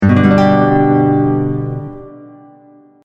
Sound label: Classical Guitar Fretboard Voicing; chorducopia